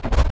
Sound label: freezer; Sir Bombalot; 501 Great Games - Volume One; Guildhall Leisure Services